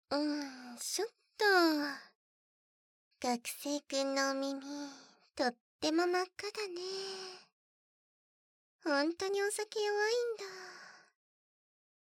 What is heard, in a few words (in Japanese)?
耳舐め手こき, 効果音無し